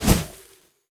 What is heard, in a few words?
Grenade Snowball Throw